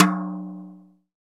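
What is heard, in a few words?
tom slap, acoustic toms; Roland - Rhythm Section; Real Toms 1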